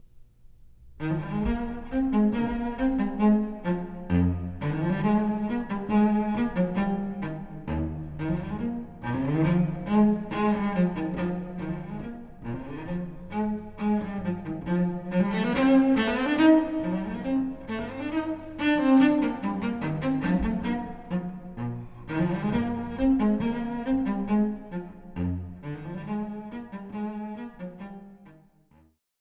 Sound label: Suite No.4 in E flat Major Bouree; BWV1010